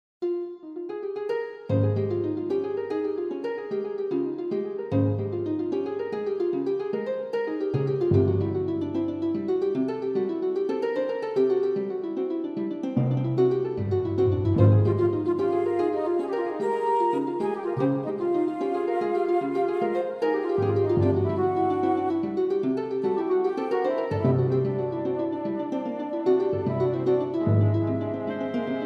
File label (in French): Danse pour Harpe et Timbale